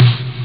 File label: Snare